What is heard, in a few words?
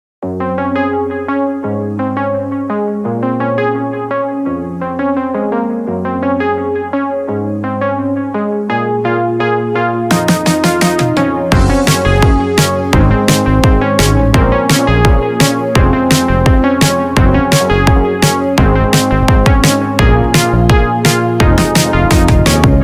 ringtone instrumental ringtone